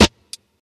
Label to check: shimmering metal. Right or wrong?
Wrong